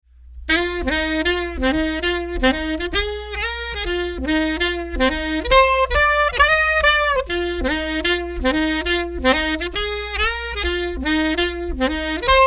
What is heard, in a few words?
chorus, sax